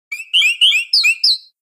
Grito de Oricorio estilo apasionado

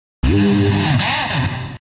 Grito de Abomasnow; Actualizando grito en la sexta generación (XY); Gritos de Pokémon de la cuarta generación

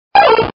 Grito de Farfetch'd

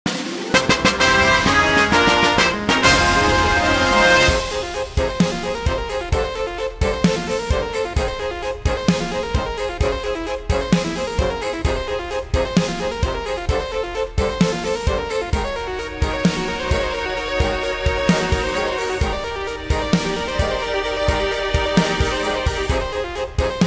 Tema de victoria; Final Fantasy; SSB4

